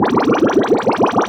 Tractor Beam Loop

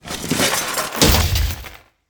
Car Compacting Eject

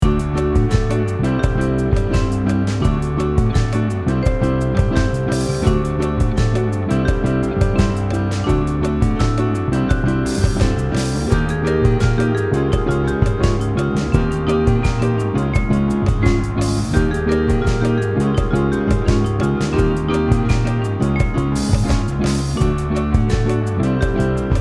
World Loop

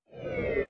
zoom out; Inception The App

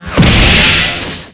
Skill Alchemist Spread Shock

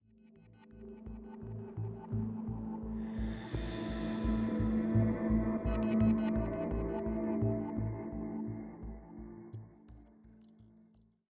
85bpm; Research High; Legends of Pegasus; windows gamerip 2012

